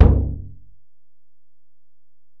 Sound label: Ac BD, Alesis DM-PRO; 300 Drum Machines